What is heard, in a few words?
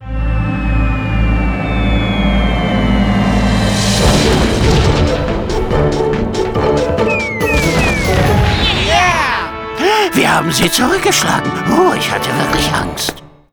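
Outro Victory German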